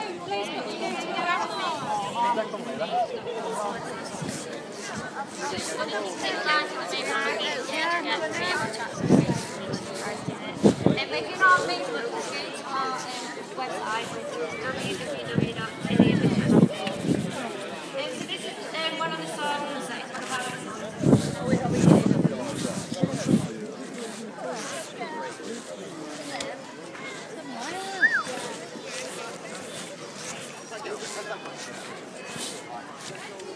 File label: thornbridge hall garden party